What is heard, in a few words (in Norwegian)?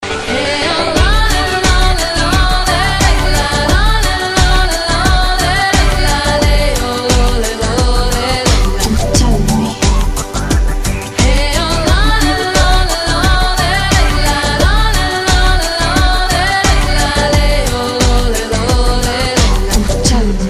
reinsdyr joik